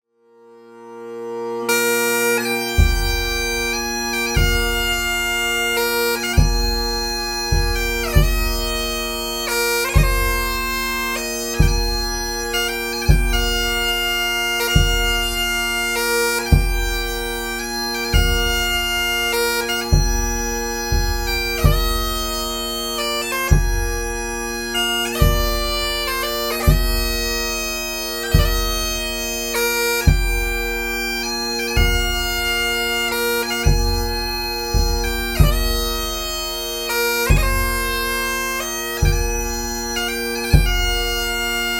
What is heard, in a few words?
Steal away with Bass Drum, bagpipe